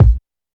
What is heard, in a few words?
boom kick single hit a sharp key, Royality free kickdrum sound tuned to the A# note; kick drum samples